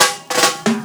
Loops Fills; Vengeance Samples

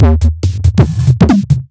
Breakbeat; Vengeance Samples; VEC2 Loops

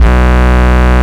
Root B, Ultimate Bass; Vengeance Samples

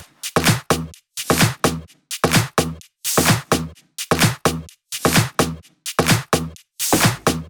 128BPM Brazil Kit; Vengeance Samples

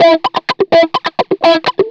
125 a, guitar licks; house; Vocal House; PS-28 Vocal House Samples; instrumentloops

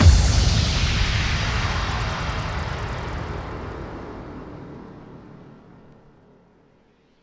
bassdrum reverb FX kick; Vengeance Samples

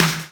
Snares & Claps; Vengeance Samples

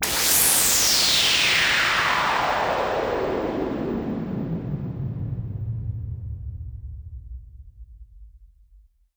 Downlifter

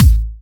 Hard Kick; Vengeance Samples

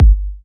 Bassdrums; Vengeance Samples

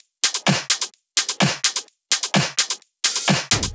Drumloops 128BPM

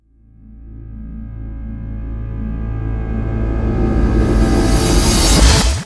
Tonal FX A

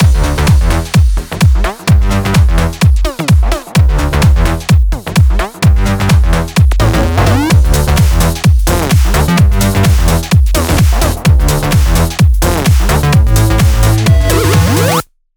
128BPM Mix; Vengeance Samples; Crystaline Kit

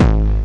BD Distortion, Bassdrums; Vengeance Samples

